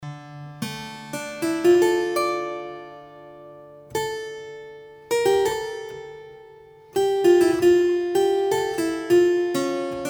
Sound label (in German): chembalo